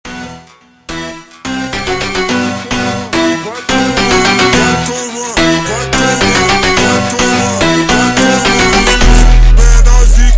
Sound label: Montagem ultra Slowed